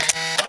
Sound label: shutter; WhiteCat 3.0